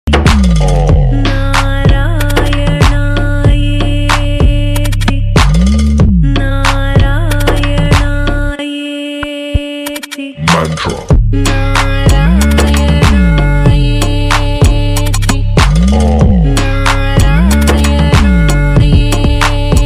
Indian Trap Remix Ringtone

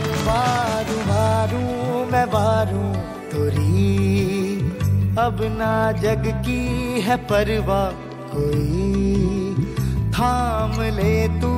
Lyrical Song; Mirzapur

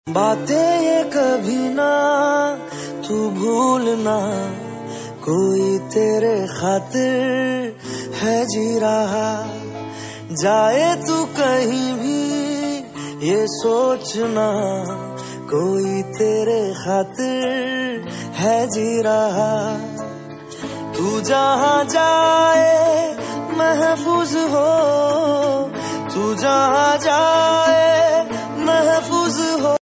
sad song

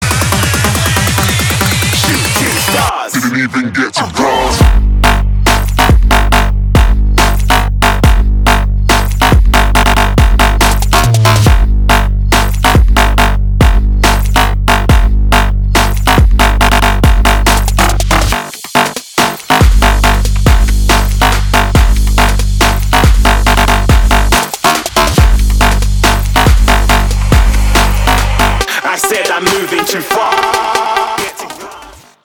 Ringtone

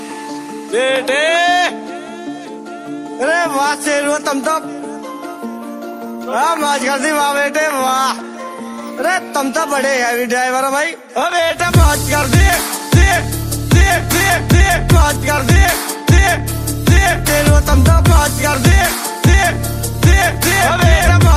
Remix

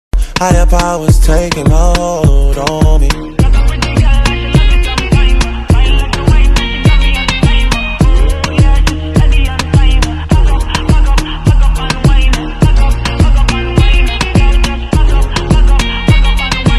Ringtone

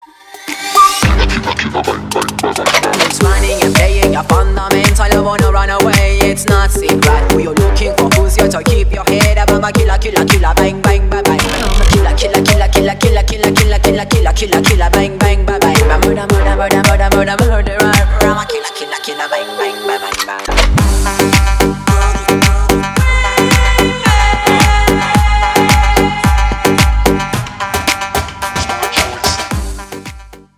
Villain beats Ringtone

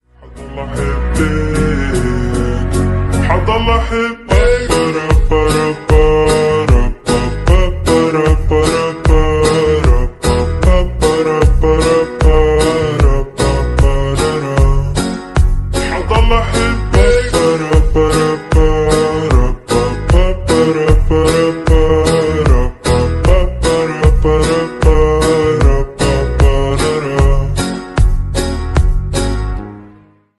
Ringtone

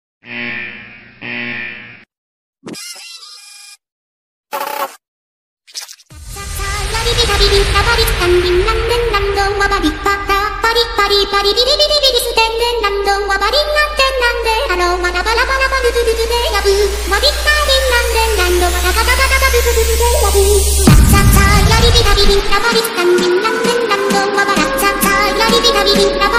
Ievan Polkka Remix Ringtone; Cat Vibing Meme; English

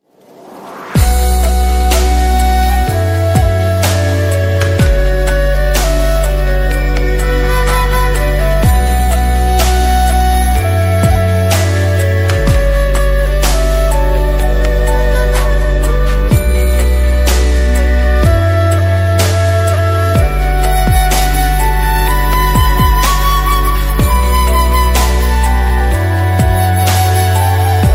Sad Story Ringtone

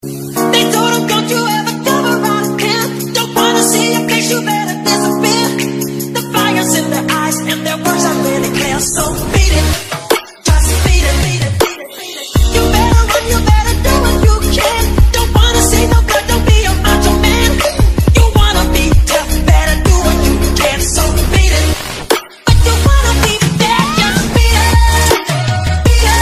Ringtone

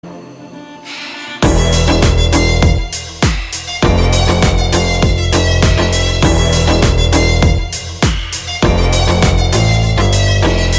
Instrumental Ringtone

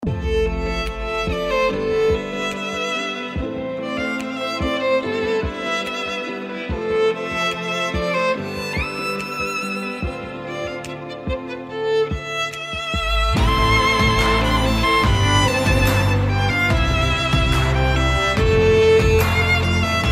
violin

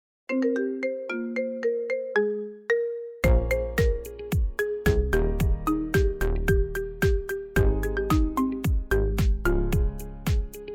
Marimba Remix Ringtone Remix Cover

